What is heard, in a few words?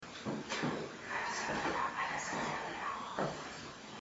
whisper reverb; evp